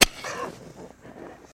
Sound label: Shovel Dig Dirt; Shovel Into Dirt With Scraping